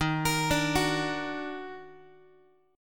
D sharp Minor Major 7th, Guitar; arpeggiated

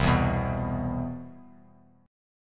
C Major 7th, chord; CM7 strummed; JGuitar

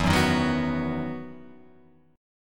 E Major 7th Suspended 2nd, Guitar, Open F; EM7sus2 strummed

